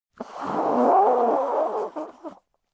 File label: Nose Blowing